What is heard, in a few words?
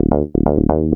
BSE 125 C, BASS LOOPS, House; Best Service ProSamples vol.10 - House; AKAI